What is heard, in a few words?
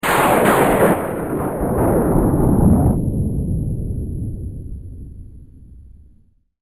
thunder